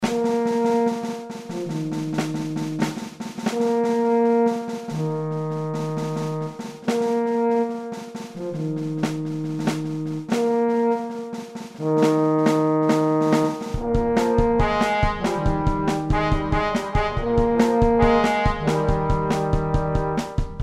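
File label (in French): tuba batterie trombone trompette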